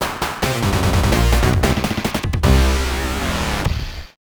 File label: game over; contra hard corps the 16bit audiophile project genesis gamerip 1994